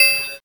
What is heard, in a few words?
ding